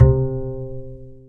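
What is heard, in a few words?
double bass C3; AKAI S6000 CD-ROM - Ultimate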